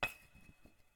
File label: Rocks